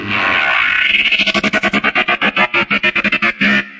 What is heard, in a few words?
transforming robot spin up